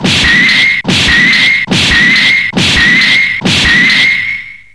Melee

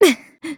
hit

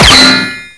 ric met, weapons

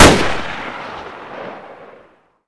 uzi

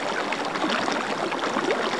sewage

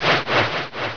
fan

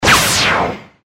long jump

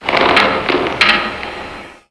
creak

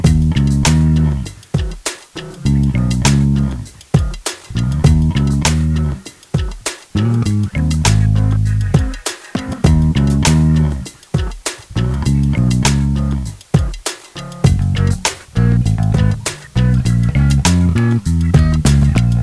song

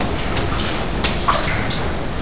water, ambience

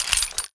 m500 shells out

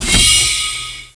DOOR OP

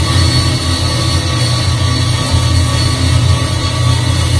drone room industrial

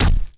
hit, weapons

